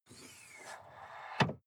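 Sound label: sports car exterior trunk hydraulic; verkehr-transport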